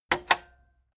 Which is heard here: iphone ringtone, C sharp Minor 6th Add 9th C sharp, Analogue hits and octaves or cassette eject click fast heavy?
cassette eject click fast heavy